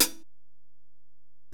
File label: HiHat, Alesis DM-PRO